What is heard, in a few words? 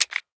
p250 clip out, weapons; Counter Strike Model Pack; CS:GO